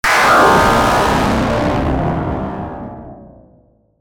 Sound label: Impact Static Blast; bottlerocket